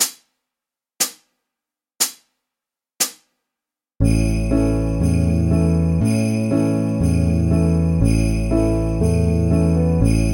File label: jingle; xmas